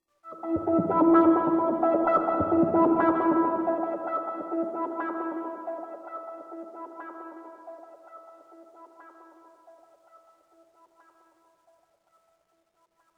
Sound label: One shot Synth; zombie army 4 dead war ps4 stadia switch windows xbox one gamerip 2020